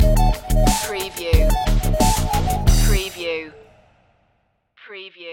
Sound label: land attack stinger